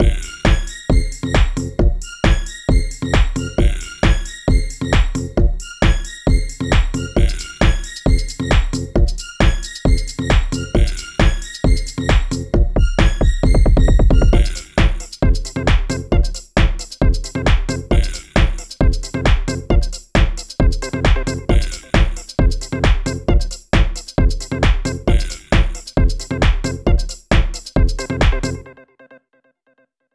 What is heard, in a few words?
Garage Room 134 bpm, Old Skool House; Big Fish Audio; Construction Kits